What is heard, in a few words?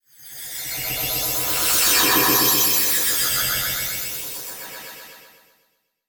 Production Element Title Transition Communication Transmission